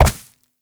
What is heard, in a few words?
Step Vegetation; Warframe; Uriel; Movement; Steps